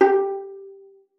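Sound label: violin pizzicato G4; Xsample VOL-5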